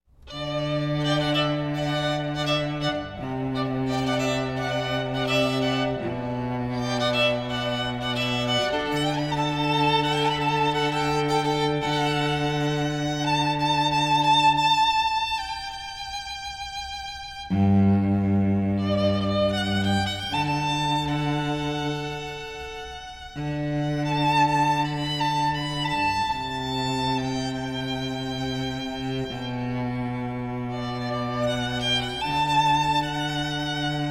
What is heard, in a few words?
Duet of Cello, Duet of Violin & Cello and String Quartet